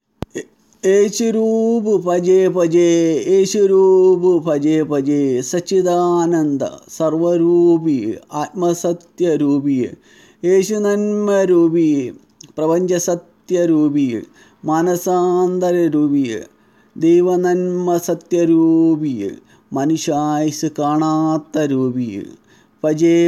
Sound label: jesus chant; യേശു കീര്‍ത്തനം